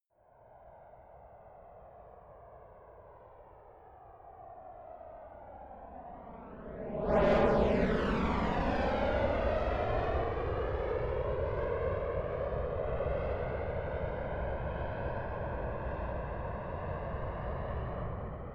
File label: military jet f-22 raptor flyby right to left fast distant